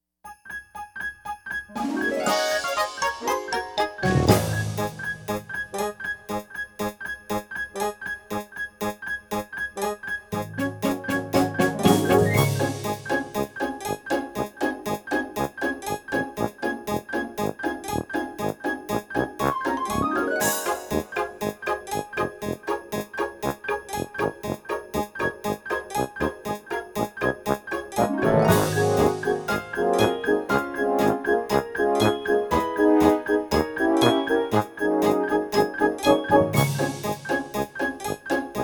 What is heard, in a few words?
Tap Tap Tap Snap